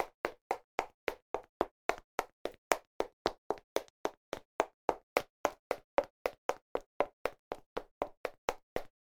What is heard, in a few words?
dash high heele